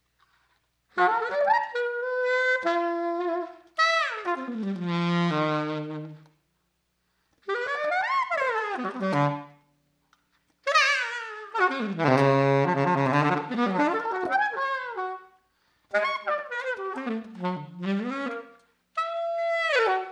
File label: streaming melody